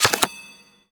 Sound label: cards select, ui; Counter Strike Model Pack; CS:GO; panorama